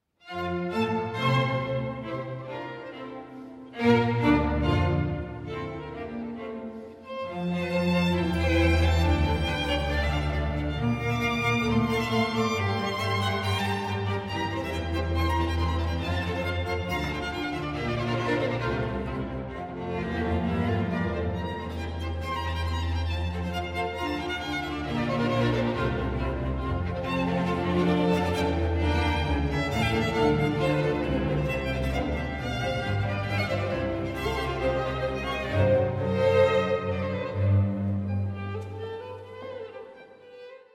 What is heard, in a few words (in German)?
Divertimento F-Dur